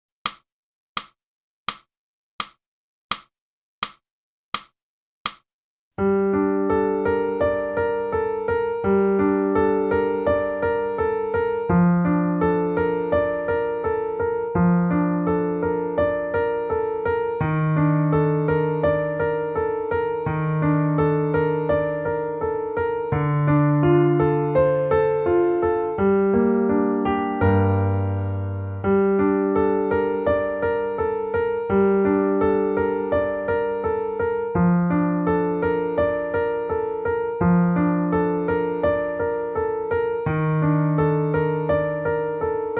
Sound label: clarinet